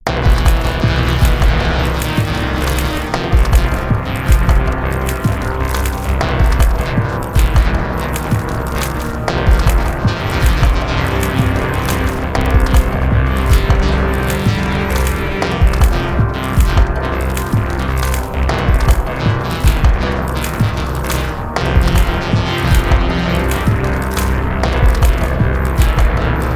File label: bird opener